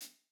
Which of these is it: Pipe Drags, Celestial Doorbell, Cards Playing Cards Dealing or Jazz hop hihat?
Jazz hop hihat